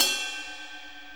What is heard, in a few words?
Bell Ride, Cymbals; Sonic Foundry (Sony Creative Software); Loops & Samples Drum Tools